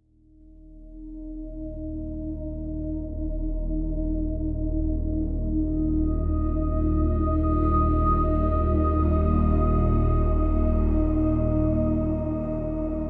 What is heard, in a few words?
ethereal cinematic for meditation glitchy sfx 120bpm